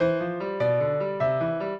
trio, piano; Mozart

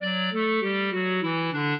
clarinet; Mozart

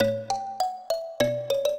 minuet, mbira; Mozart

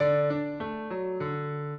piano; Mozart; minuet

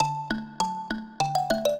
minuet, mbira; Mozart

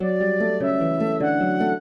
trio, flute harp; Mozart

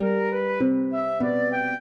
trio, flute harp; Mozart

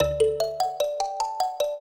trio, mbira; Mozart

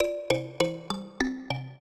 trio, mbira; Mozart

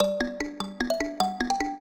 trio, mbira; Mozart

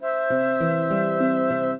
trio, flute harp; Mozart

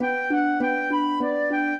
trio, flute harp; Mozart

